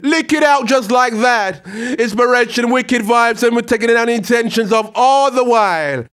HAL RAGGAM, RAGGA-MUFF A maj

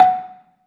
BELA2 F#4, BELAFON, Asian Traditions; USB Soundscan vol.60; AKAI

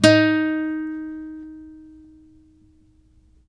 acoustic D#3 R, guitar; East West; Steve Stevens Guitar Samples Collection; ACOUSTIC PCK

